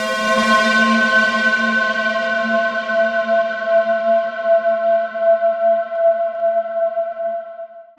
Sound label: Steampipe Saturated Pad G 5